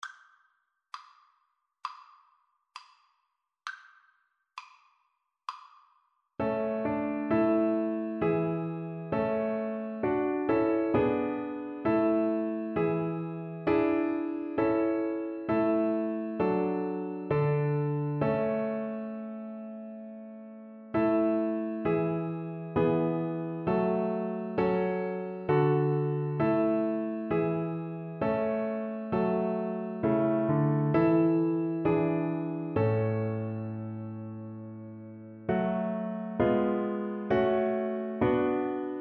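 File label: viola karaoke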